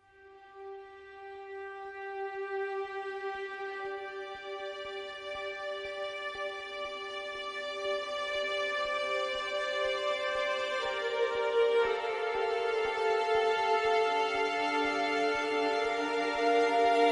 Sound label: Eternal Ambient